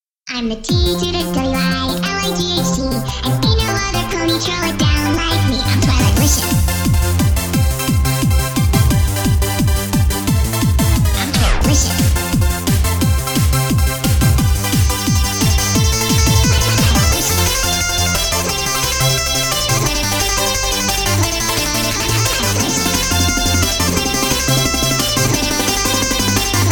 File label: chibi techno